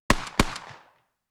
USAS-12 12g solid slug 1m right clean Double shots; Pole Position Production